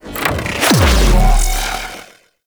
Zanuka Companion Power Denial Cast; Warframe; Characters; Abilities

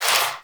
Rifle Reload; Warframe; Weapons; Zariman Rifle

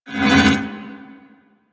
Void Portal Rotate One Off

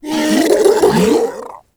Infested Ancient Strike Scream; Warframe

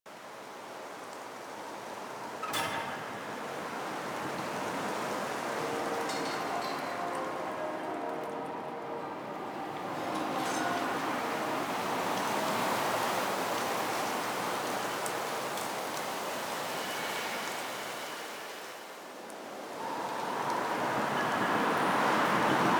Winter Exterior Large Bed Loop; Nineteen Ninety Nine

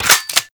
Reload; Gotva Prime; Weapons

